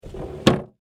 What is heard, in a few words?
Foley Desk Drawer Close; Drawers; Household